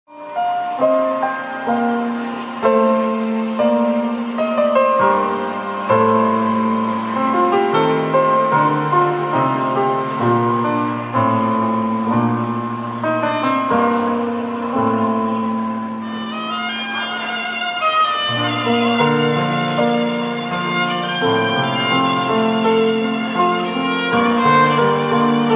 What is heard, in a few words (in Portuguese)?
ORQUESTRA; POEME